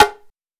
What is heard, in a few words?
Djembe High Hard; Drums; Misc Percussion